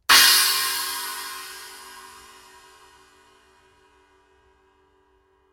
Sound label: ZBT 16in China